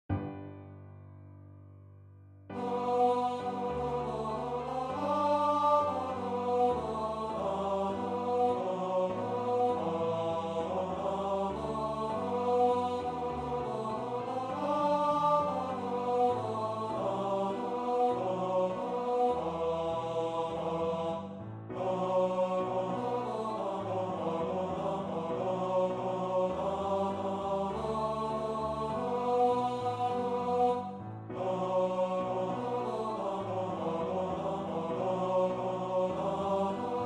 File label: Ukraine National Anthem Tenor 1